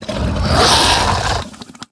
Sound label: Flesh Giant Attack